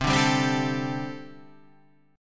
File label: C 6th Suspended 2nd C